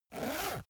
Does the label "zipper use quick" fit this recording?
Yes